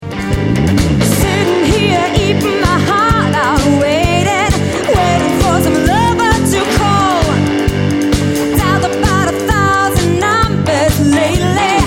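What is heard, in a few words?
Quintet (vocals, guitar, guitar, bass, drums)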